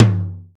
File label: high tom drum one shot g key, tom drum sound tuned to the G note; tom drum samples